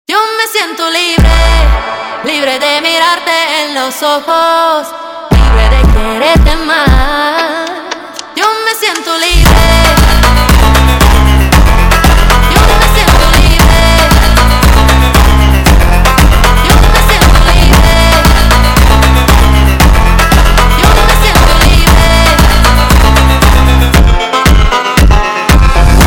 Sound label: Hardstyle